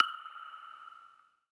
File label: clave; eddyflux